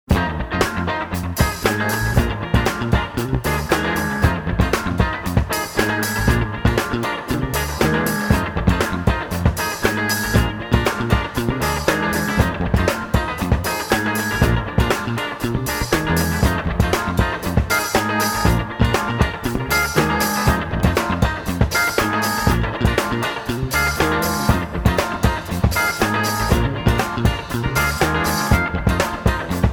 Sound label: Instrumental